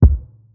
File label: kick heartbeat; Drum Sample Pack; One Shots; Slate; SEISMIC